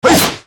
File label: Salt Shot Harpoon